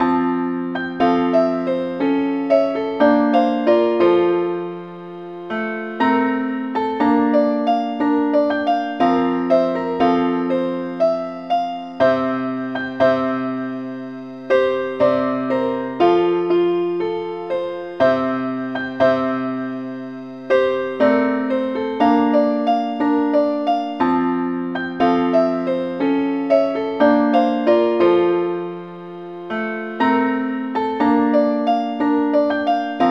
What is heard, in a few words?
keyboard only